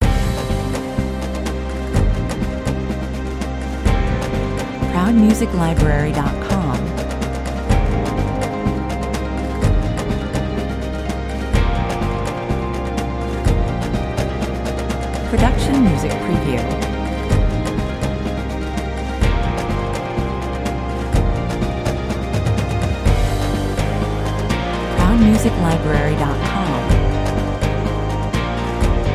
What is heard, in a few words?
adrenaline rush 125 bpm